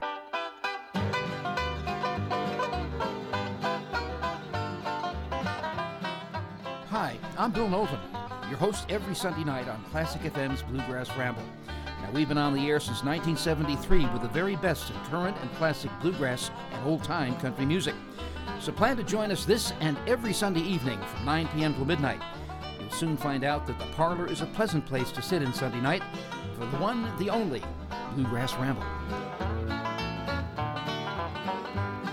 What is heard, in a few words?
bluegrass ramble